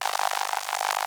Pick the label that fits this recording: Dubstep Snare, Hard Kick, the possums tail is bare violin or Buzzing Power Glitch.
Buzzing Power Glitch